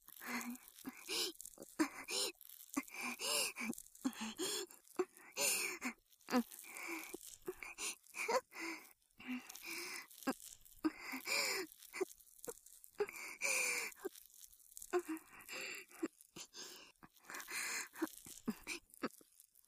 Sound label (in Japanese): ループ濡れ綿棒（通常左版）, ASMR